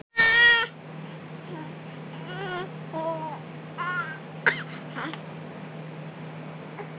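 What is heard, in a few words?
baby hungry, female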